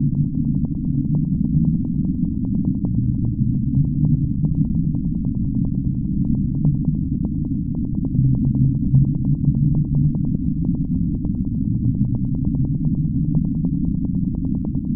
upsweep slow

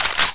hk416 bolt pull; cso

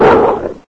pit drone die; scmod; alienassassin

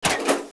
toaster toast go down

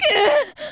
pain; videl

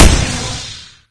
fire; synthsoldier

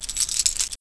saw chain, weapons

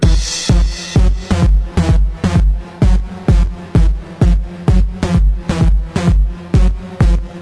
song

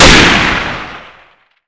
shot

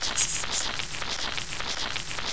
shock idle, weapons

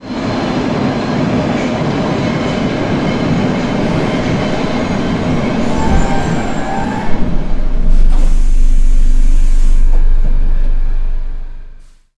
train stop

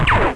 fusion firing, weapons

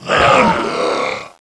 die

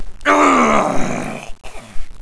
death

